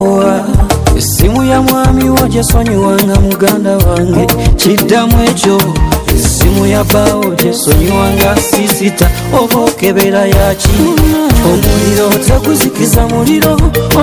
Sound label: ugandan music ringtone